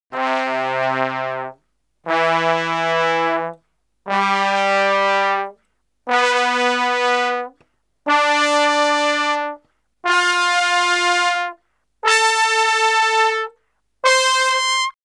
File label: Salsa Picante; Masterbits; World Zone VOL-3